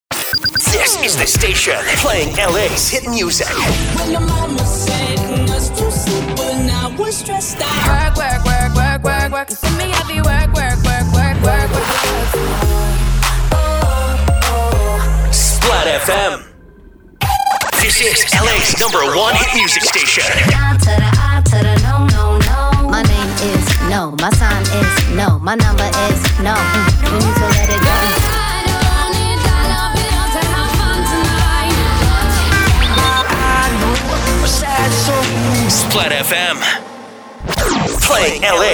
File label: beatmix music montages pop imaging samples